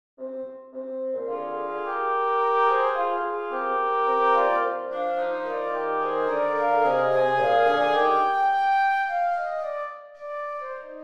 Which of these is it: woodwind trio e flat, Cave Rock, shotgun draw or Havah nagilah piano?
woodwind trio e flat